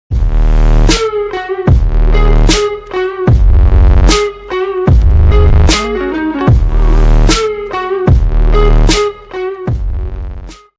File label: Intro with bass